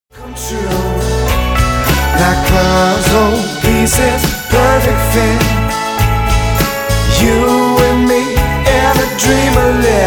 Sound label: Diamond Rhodes Moog String Arrangement